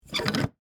Metal Hinge Open, Foley, Metal, Hinge, Open; Latches; Objects; BlastWave

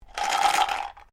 Dice medium shake plastic cup; Casinos; Variety of dice sounds

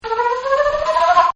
FLUTE, Woodwinds; Sound Effects:Musical

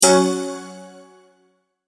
multimedia tone exclamation; McKinney Sound

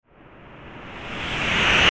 Ascend Crescendo Airy High Abrupt; Ascending; Blastwave

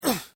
Pain Umph, Male Painful Grunt, Hurt; human sound effects

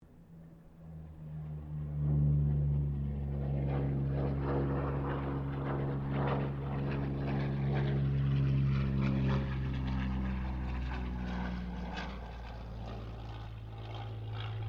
AIRCRAFT P-40 KITTYHAWK PASS DISTANT; P-40 Kittyhawk / Warhawk / Tomahawk; Airplanes; Vehicles

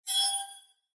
Bell Ding Airy Whoosh; Scifi Production Elements, Set 2

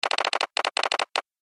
Telegraph Sounder, beep; Telegram; Beep Sound Effects

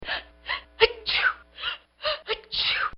Doctor S Office Patient S Sneezing; Hospitals; Bobby Cole

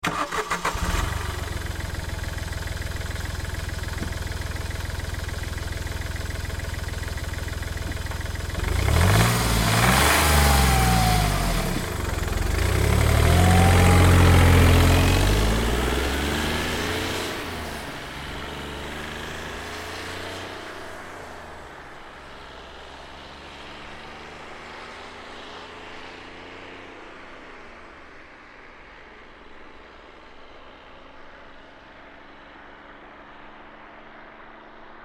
Car Smart Car Start Away Fast; 2005 Smartcar Fortwo; Vehicles

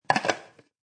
Plastic Lid Close, A plastic lid snapping shut; Kitchen; Household

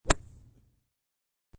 Golf Drive Wood, a golf drive with a wood driver.; High quality stereo recording of a golf drive with a wood driver.; Sports

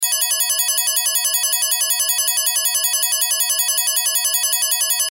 Casino Slot Machine Bell Alarm Win Jackpot Loop; Gamble; Sports

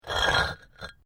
PAVING STONE FALL; Material components for constructing more complex sounds; Drop Sound Effects; Drops (Sound Effects:Objects)

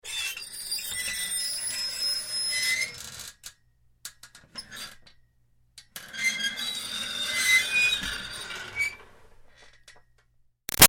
Screech Metal Iron Board Slow Creepy; Ironing Board; Slow Start; Materials

